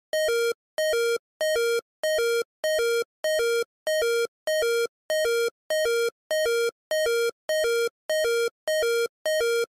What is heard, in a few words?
low health critical health; pokemon